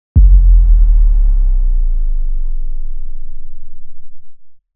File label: Spoesh, Hits